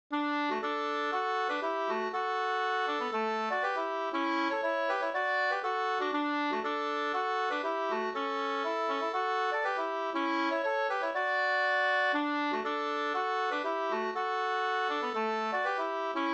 pols Rorospols; Snar a ta at 2st

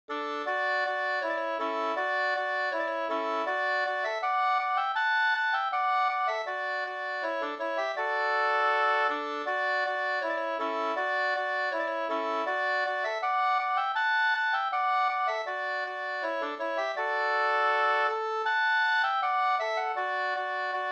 skanklat; Mora aldre brudlat Da

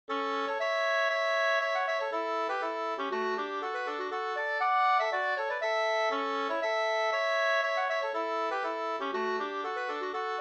polska Bingsjopolska; e Slunk Jerk Nr1 utl av Bosse Larsson 2st

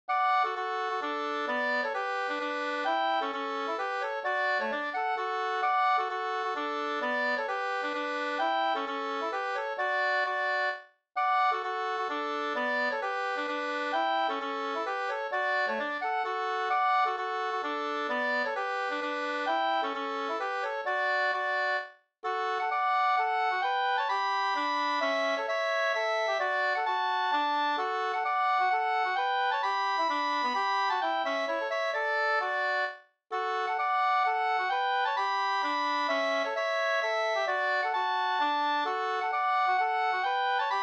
hambopolska, 2st

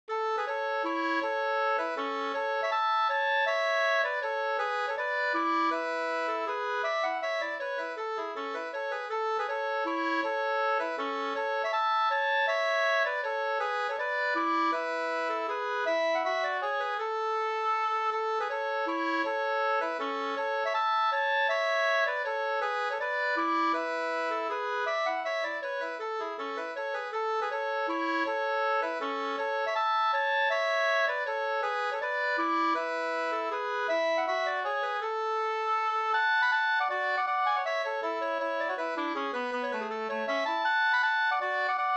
vals Brudvals

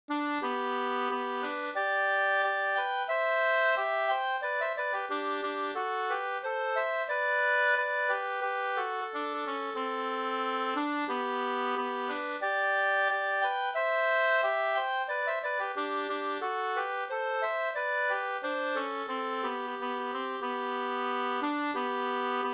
brudmarsch; fr Ronnofors

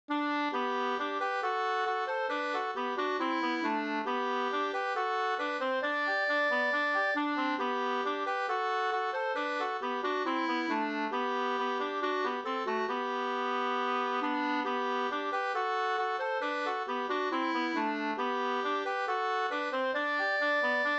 ganglat Gdur

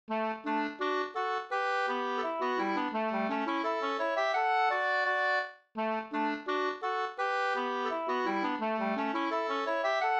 schottis 4 4; Pater Katt e Lasse i Svarven 2st

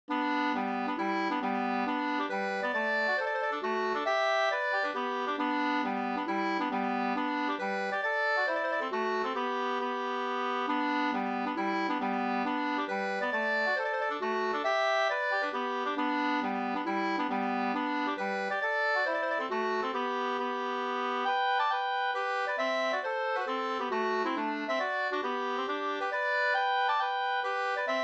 hambopolska; Brors hambo e Bror Dahlgren Sk 2st

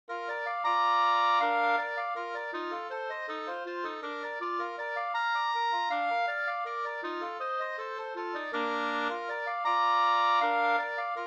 vals

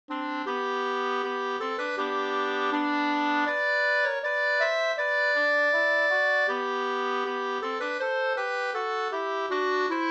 brudmarsch 4-4; av Spel-Arvid Karlsson; Trosa

